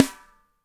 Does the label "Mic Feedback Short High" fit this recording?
No